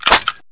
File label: reload sniper Clip Out